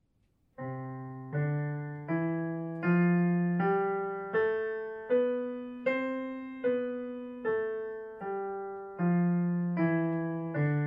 C Scale two hands

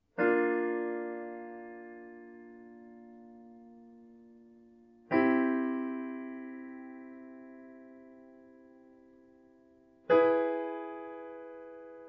Modulating by Whole Steps

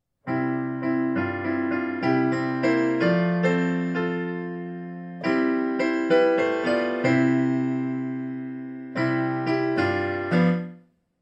Slowing down tempo

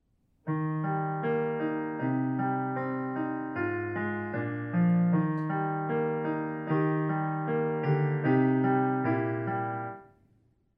Arpeggiate block chords

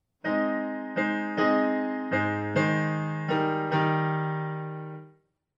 Altering beat patterns

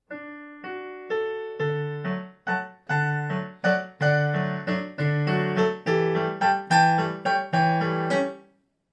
Speeding up tempo

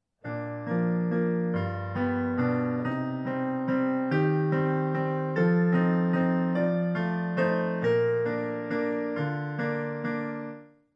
Make me a Blessing accomp style

